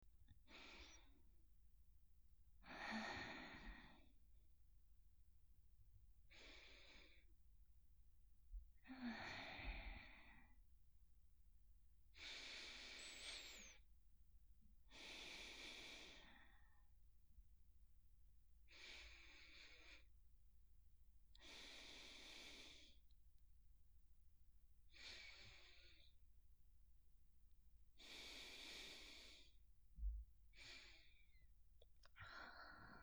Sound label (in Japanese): 寝息ループ